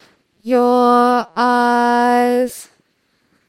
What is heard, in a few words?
singing